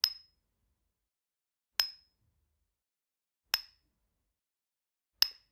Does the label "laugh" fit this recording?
No